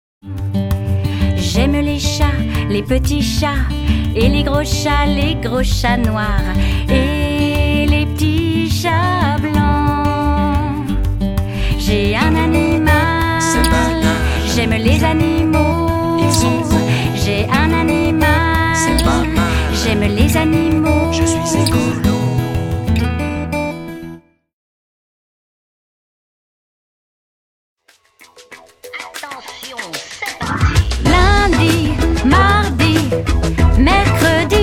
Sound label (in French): Chansons pour enfants